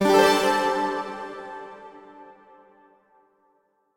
Clear F0 Echo; Mixolumia; Tessellation

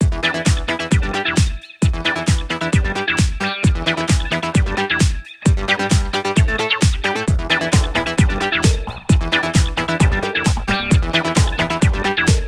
electronic; Avoid Sensory Overload

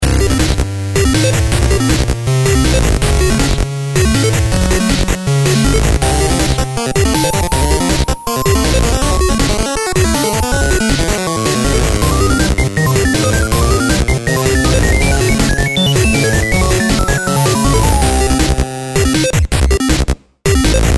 Bgm Retro; Run Run and Die; PS Vita; Wii U

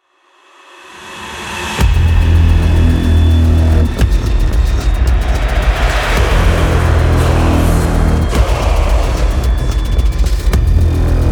Music Main Ambient; Dlc2 Tech Hell Music Main Ambient 0

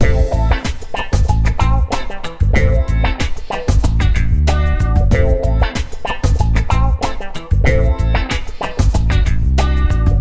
Succeed; th3 plan; ps2

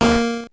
multiplier A#4

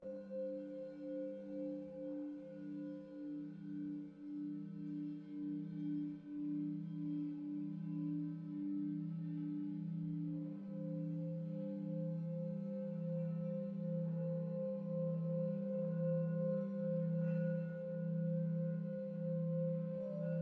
Cave SFX Energy BG Singing Bowls; One Hand Clapping

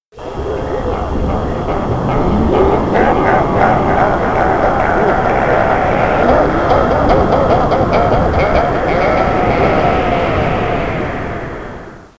Quit Menu; Gahan Wilson S The Ultimate Haunted House

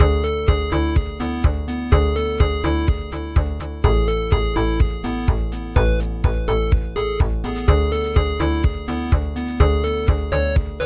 Sci-Fi; super mustache

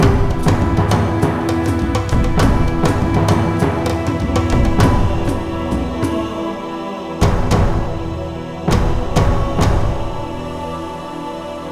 Afrodrums Endchant; Halo 2; Xbox 360

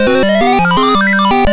Round Start; Iron Trackers Atari ST gamerip 1989

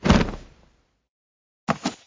Parachute package; Talking Tom Gold Run

